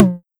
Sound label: Tom High TR909